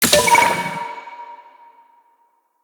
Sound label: Insect Med Station Activate; Liset; Liset Powers